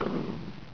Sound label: firework